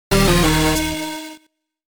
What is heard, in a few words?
Fail Sound; Loopz Remix